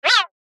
MOUSE OVER SQUEAK, Rollover, Fast, High, Pitch, Musical; Production Element, Imaging Element, Accent, Transition; Multimedia; Blastwave